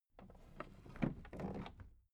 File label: toolbox put down soft